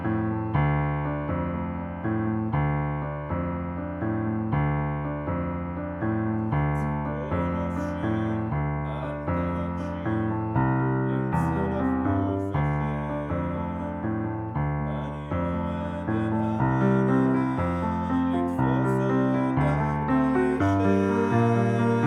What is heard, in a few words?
Piano L